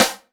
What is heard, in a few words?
SD, dance drums hip